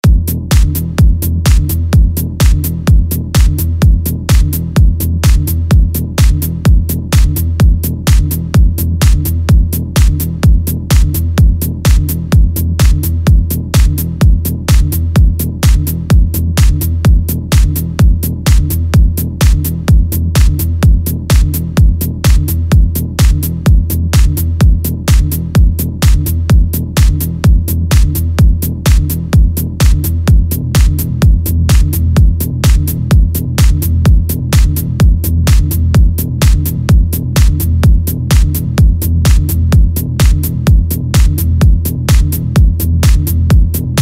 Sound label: Art of Ambiance; TECHNO